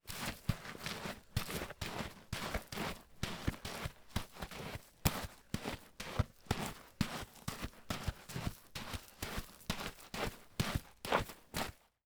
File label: footsteps soft sole shoes running on dirt ground